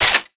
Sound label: shoot